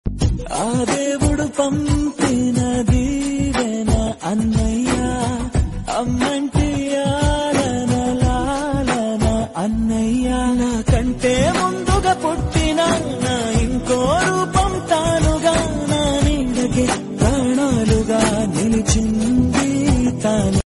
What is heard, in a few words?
telugu songs